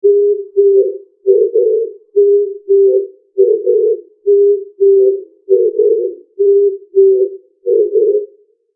キジバト

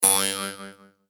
ビヨン

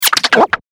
スクラッチ

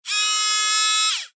ロボットアーム

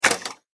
中型ロボットの駆動音

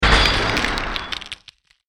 破壊音

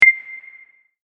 決定

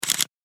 カメラシャッター

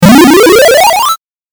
8bit 上昇

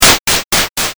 8bit 階段

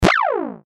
ショット

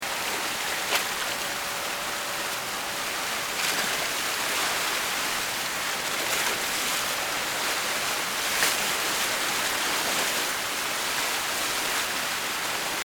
荒波 ループ

